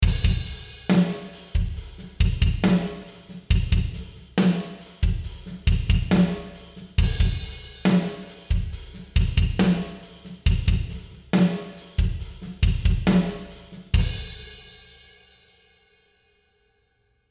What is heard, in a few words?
drums in warehouse 57s, snare